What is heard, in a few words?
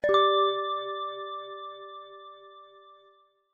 echoed ding, dings; standard ringtones